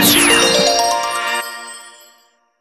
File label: Jingle New Dish; StreetPass Mii Plaza; Feed Mii; 3DS